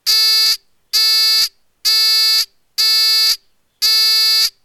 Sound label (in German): Troete, Piezo Summer; Piezo-Summer der ab 1,2V relativ hoch piept; Piezo Summer aber als Trööte